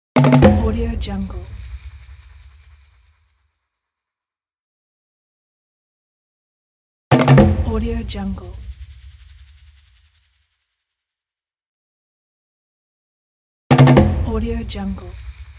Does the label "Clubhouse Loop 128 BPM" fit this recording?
No